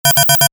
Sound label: Computer Beep, Short; Multimedia; Stuart Duffield